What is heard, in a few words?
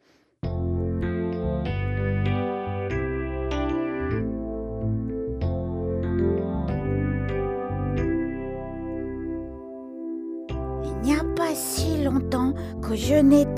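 Baby Bear Song, French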